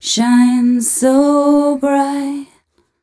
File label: f 132 mel, Vocals, fem